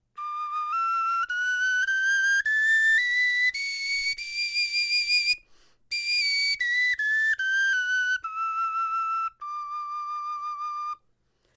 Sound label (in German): tonleiter, knochenfloeten